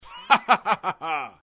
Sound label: risa maligna malign laugh